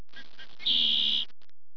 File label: redwing, blackbird